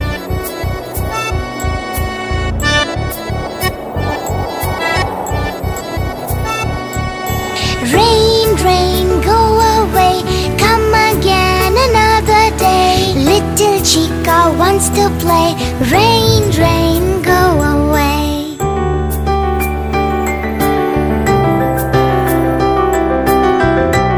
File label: rain rain go away nursery rhymes